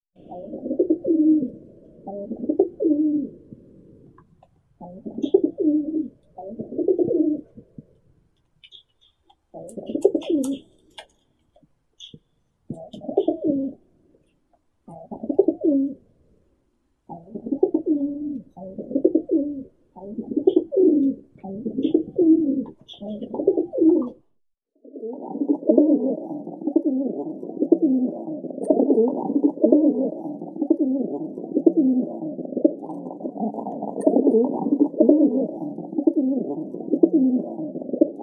golub sizii columba livia

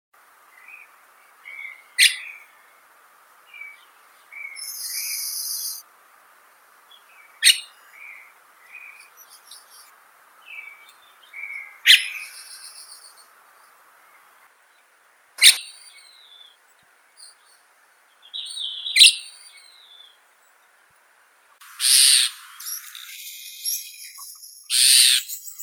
zvuki prirodi skvorets malii agropsar sturninus

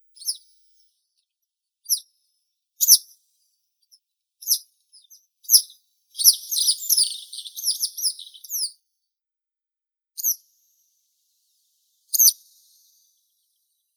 zvuki prirodi tryasoguzka malaya zheltogolovaya motacilla citreola werae

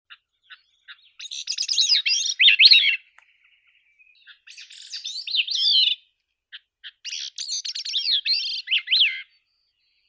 drozd malii catharus minimus

